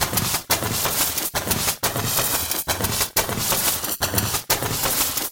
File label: snowjoke 090bpm, Downbeat Dance Grooves; Zero-G